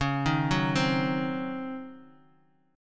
Db Major 7th Suspended 2nd Suspended 4th, Bass Standard; 21,18,16,17; DbM7sus2sus4 arpeggiated; JGuitar